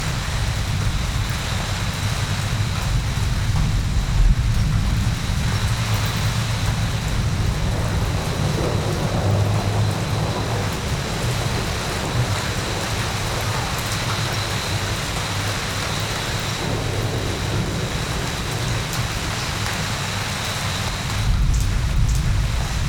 Thunder Rain

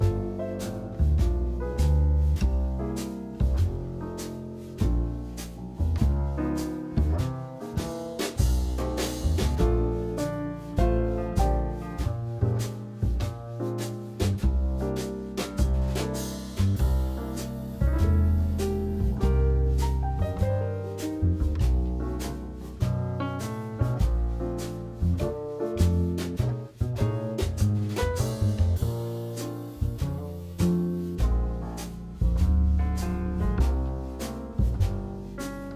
Jazz Instrumental, Jazz Clocks

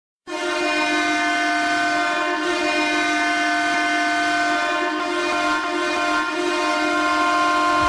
Goal horn; HK Lada

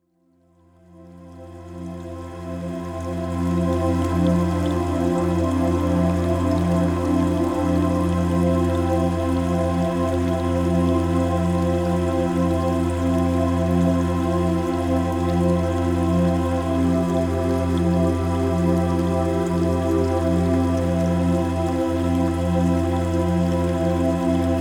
Binaural Creek Sounds

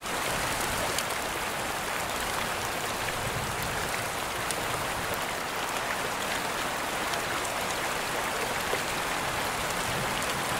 Reverberated Rain Sounds at Night

